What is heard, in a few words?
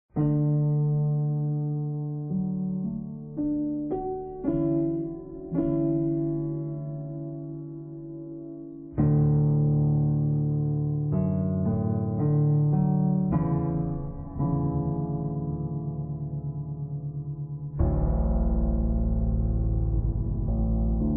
cheers; vapesquared